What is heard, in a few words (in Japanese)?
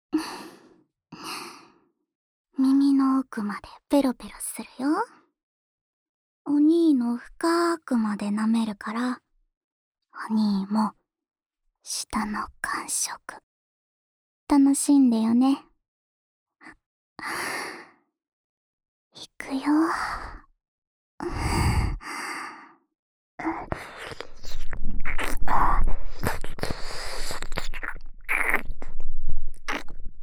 深い耳舐め（左右耳）, ASMR; ちえの、犯すような深い耳舐め; 童貞兄妹 音声作品コンプリートパック